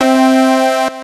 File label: buzzer, basketball